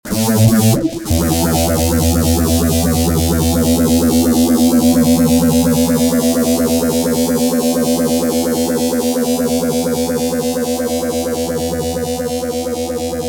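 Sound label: fuzz guitar master phaser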